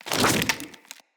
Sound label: Root Spider Mother Foley Leg Swipe